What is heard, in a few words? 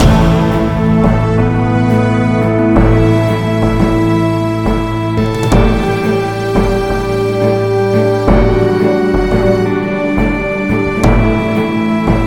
Boss Victory; Voidigo